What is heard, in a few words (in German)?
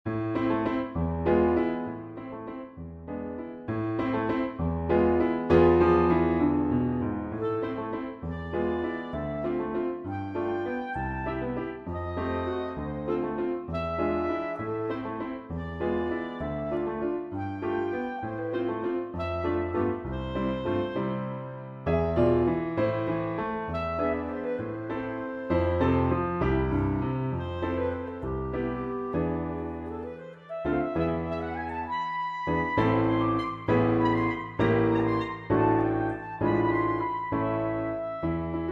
Klarinette; Besetzung: Klarinete und Klavier; Tonart: a-Moll